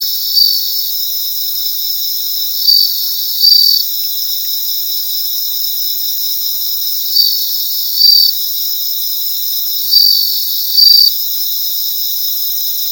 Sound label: suzumushi